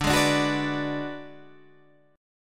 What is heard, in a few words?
D 6th Flat 5th D down Guitar Standard, chord; D6b5 strummed; JGuitar